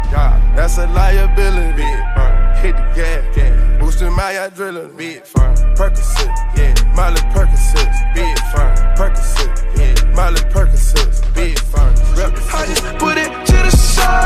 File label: new hip hop ringtone